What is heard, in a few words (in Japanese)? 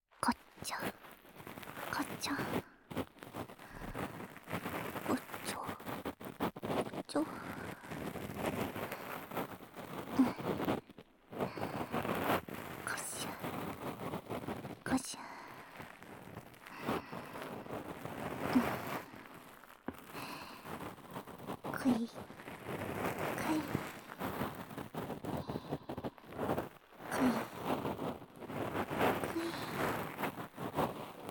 ごしごし綿棒; ASMR